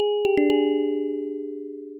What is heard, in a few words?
grave jingle; gbjam12; jingles